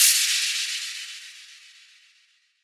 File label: crash